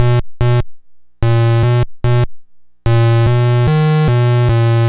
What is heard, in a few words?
Apple II Bass, Chiptune; Sonic Foundry; Sony Creative Software